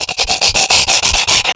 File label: Gorefast infected; zombie plague